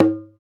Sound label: CONGA, Roland; Hybrid Kits; Club-50 - Foundations; Thin